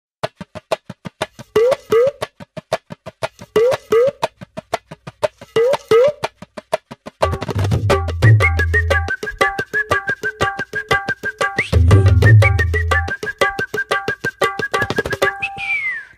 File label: Bgm